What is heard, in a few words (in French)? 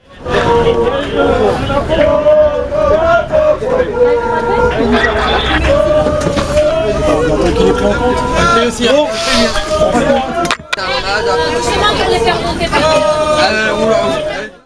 champs elysees paris chants; thomas debesse